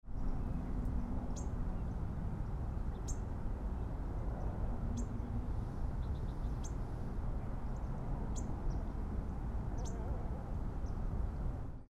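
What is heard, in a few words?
Nashville Warbler, Calvert, Maryland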